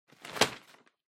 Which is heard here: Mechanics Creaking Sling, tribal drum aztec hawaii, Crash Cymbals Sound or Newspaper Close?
Newspaper Close